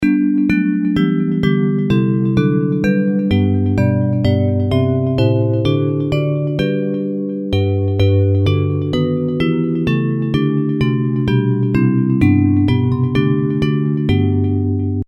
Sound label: Bells, hymn